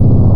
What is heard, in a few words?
Spawn Loop; johnny upgrade